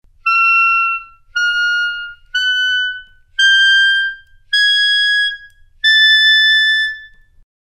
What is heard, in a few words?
E-flat Clarinet